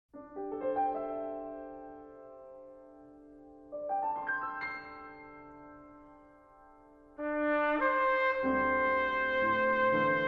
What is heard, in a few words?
Sonata for Trumpet and Piano, Slowly